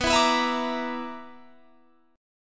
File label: B-Minor Major 7th Double Flat 5th B down